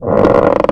coaster creak; random amb sounds

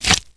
flesh, footsteps infected run

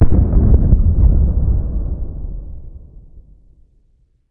dist explosion, ambient

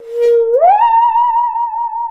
saw danger, music, zombat

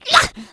rage, infected, female

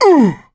hurt major, coach; survivor

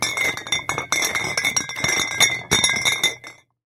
attach cola bottles

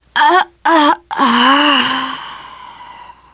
death scream, teen girl

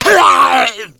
hunter pain

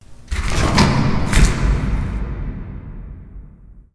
mall random, ambient

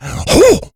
rage, infected, male; npc, action

